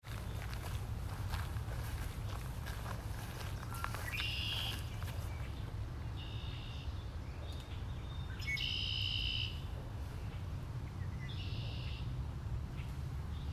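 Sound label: red-winged blackbird; rwblackbird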